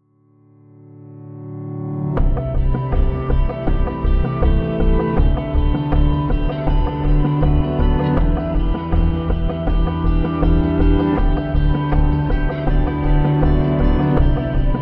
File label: Instrumental Bikalam